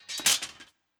Mermaid Death Metal

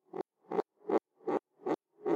Insect Weird; Physicist

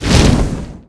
Ball Target

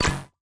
Battery Plate Magnetic-Snap

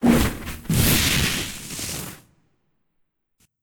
Armor

